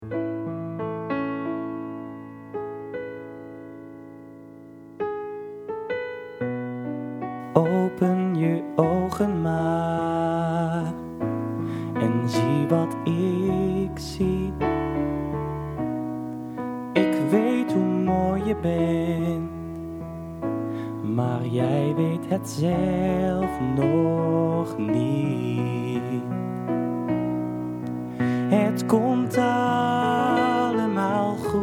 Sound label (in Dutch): vlinder met zang